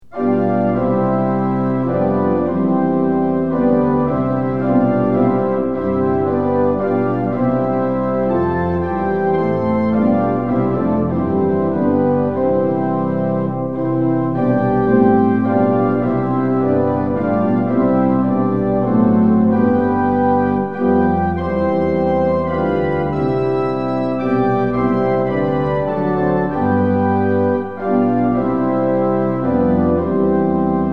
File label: tune; hymns; lillenas